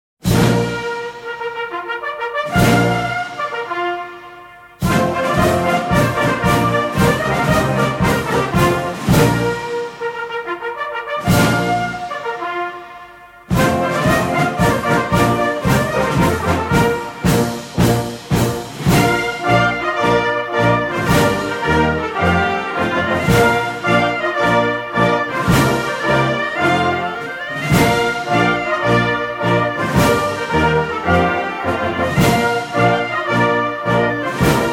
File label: Instrumental